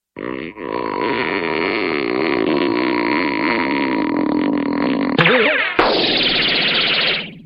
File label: stretch crash